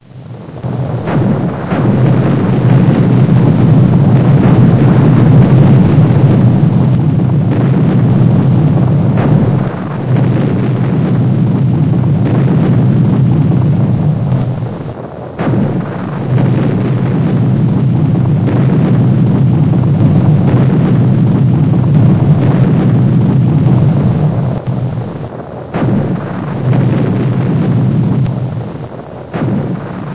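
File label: lightning; race-into-space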